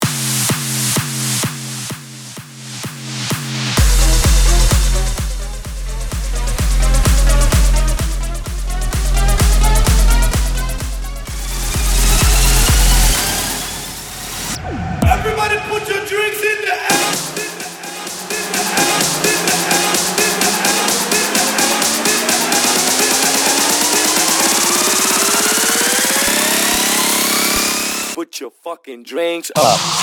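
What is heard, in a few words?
House Bootleg Mashup 128 BPM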